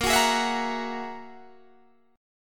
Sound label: G sharp 7th Suspended 2nd Sharp 5th